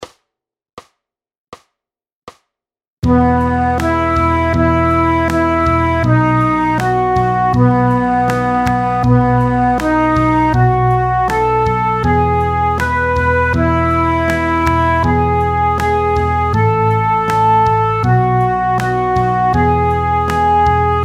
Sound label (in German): bruederchen komm tanz mit mir playalong